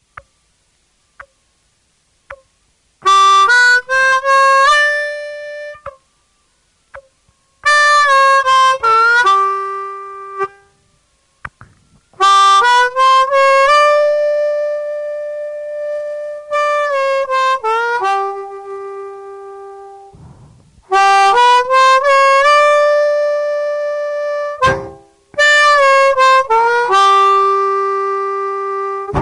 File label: blues lick, garmoshki